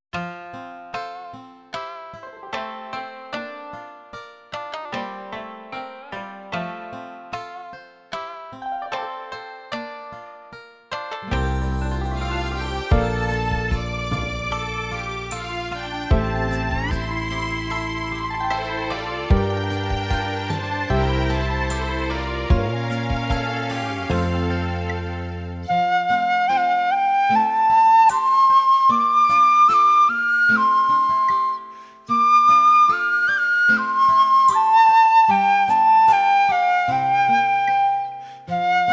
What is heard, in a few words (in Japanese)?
fue, taiko